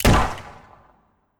Pirate Revolver; Legend of Keepers (gamerip) (2019)

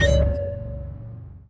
Menu Select

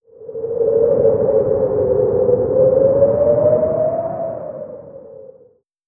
Amb Ice Intro

